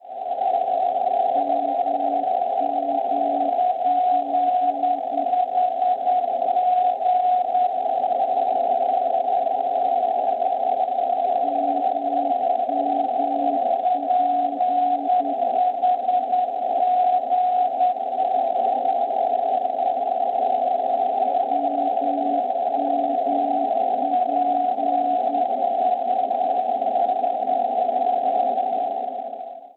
NDB Malpensa Italia 425 kHz; MMP; Schwarzsee

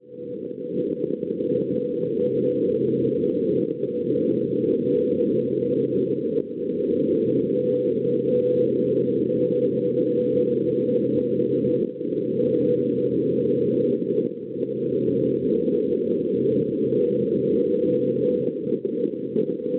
NDB Buckeburg Germania 368 kHz; BYC; Schwarzsee

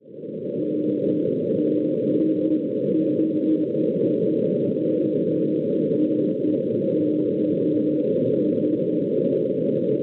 NDB Karlstad Svezia 341 kHz; NKS; Schwarzsee

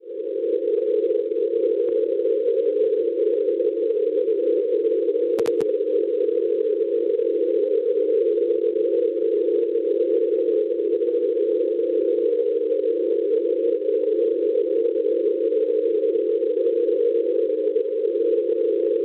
NDB, 308 kHz; MOJ; Mojkovak; Montenegro; Schwarzsee

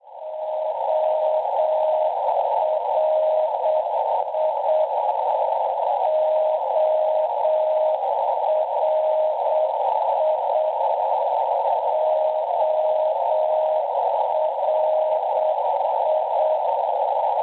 NDB, 376.5 kHz; ORI; Orio al Serio, Italia; Schwarzsee

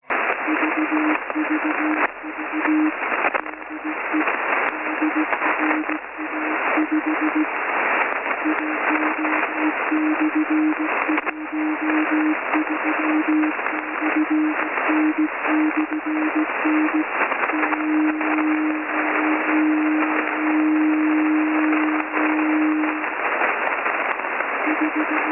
5195 kHz USB; DRA5; FTDX5000; Schwarzsee

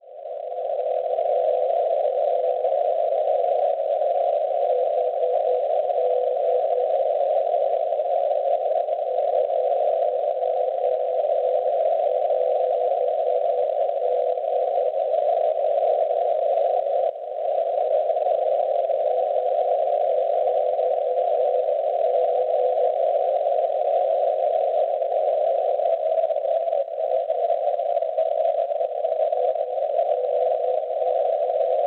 NDB Sandnessjoen 414 kHz; HD; Norvegia; Schwarzsee

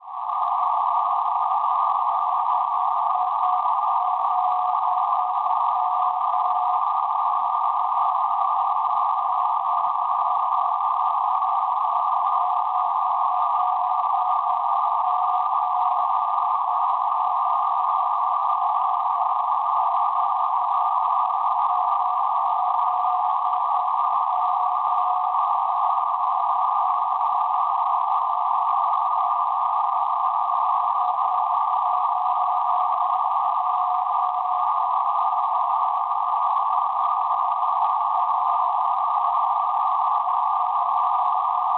NDB Illesheim Germania 488 kHz; ILM; Schwarzsee

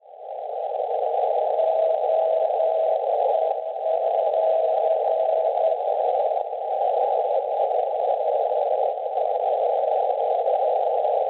NDB Charleroi Belgio 323 kHz; ONC; Schwarzsee

